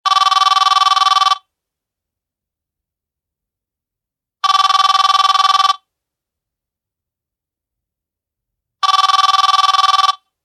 loud annoying ringtone

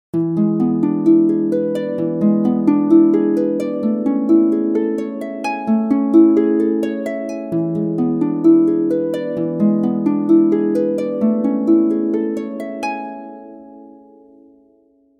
simple harp ringtone

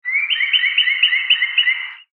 tweeting bird message tone

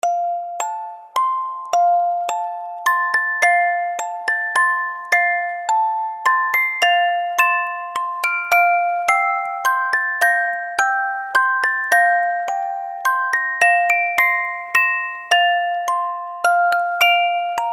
lullaby ringtone

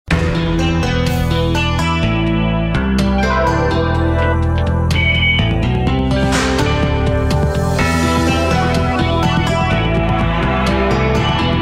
ringtone; forensic files

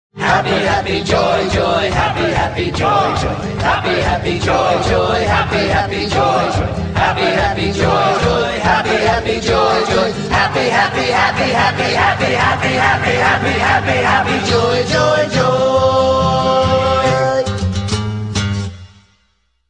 song

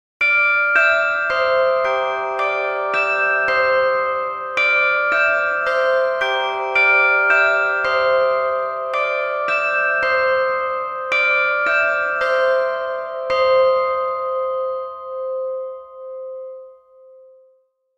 bell sound ringtone

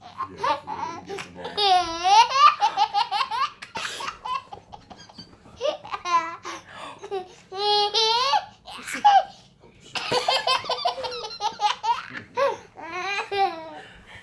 Laugh Ringtone

